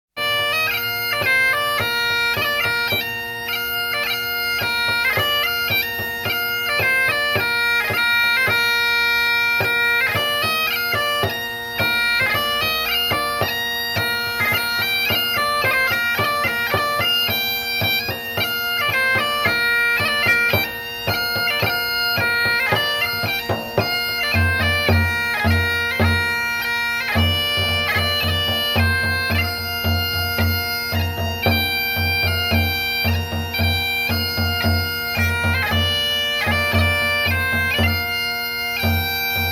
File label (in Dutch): Doedelzakken Oefenen; KMP Film Video Sound Effects